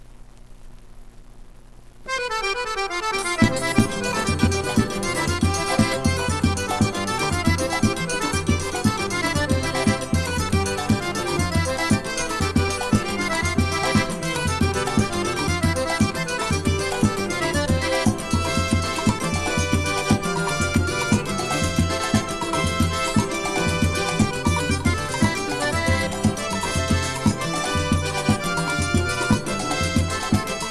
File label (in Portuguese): forro